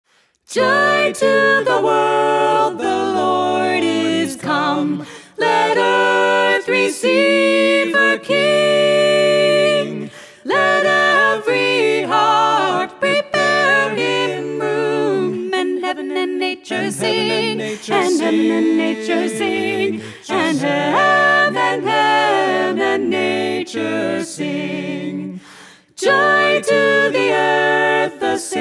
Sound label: Carolers; Boston; Joy to the World